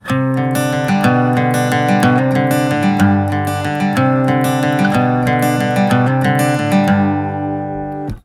Acoustic electric guitar Instrumental

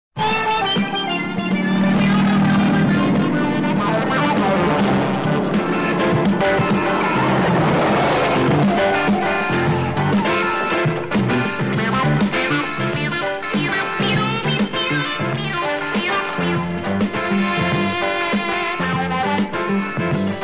car; starsky and hutch

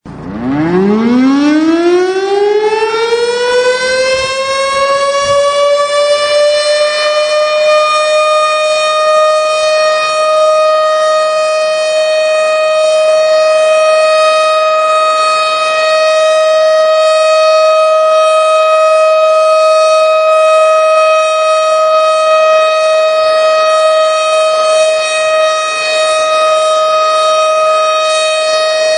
Tornado siren sound

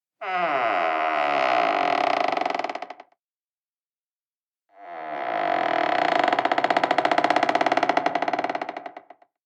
Door Creaking Sound

